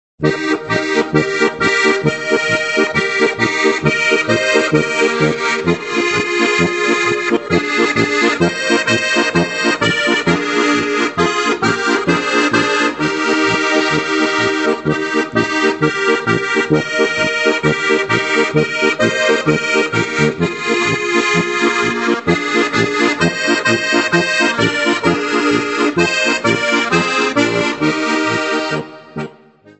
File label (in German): Autofahrer Polka